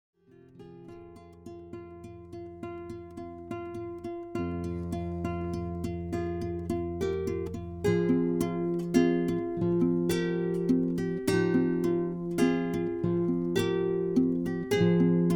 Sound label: Music for Guitars